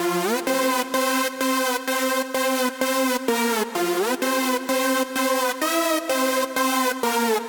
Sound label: Lead; Massive